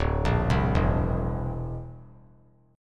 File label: D 7th Suspended 2nd Sharp 5th D; D7sus2#5 arpeggiated; JGuitar